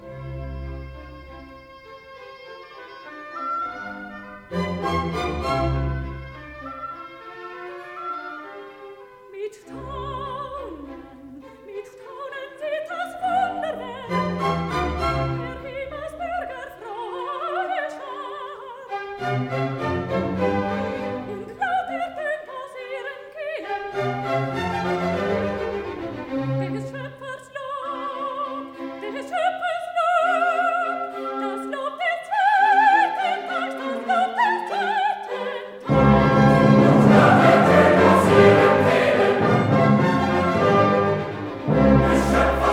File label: Solo with Chorus; 5. Solo (Gabriel) with Chorus